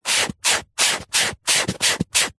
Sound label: abrasive foam or sponge rub clean concrete med strokes